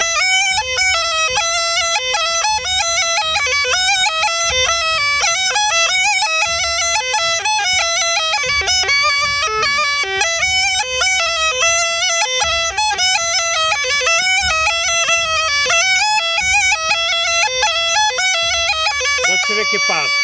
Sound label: Small Gajda